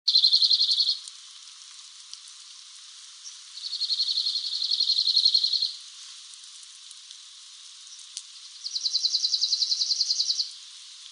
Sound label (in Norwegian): Lappsanger; Arter